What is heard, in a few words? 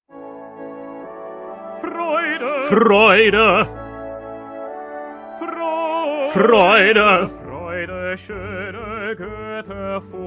Beethoven 9 Bass